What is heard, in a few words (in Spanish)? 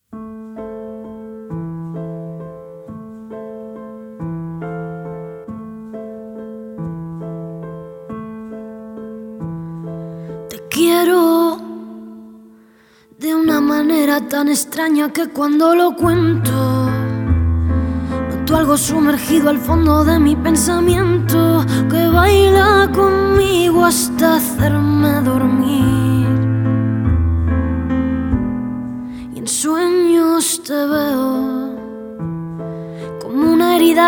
Voz y Piano